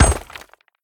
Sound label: shield ground; Smite; Cabrakan